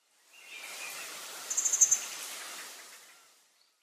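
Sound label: taiga flycatcher, sakata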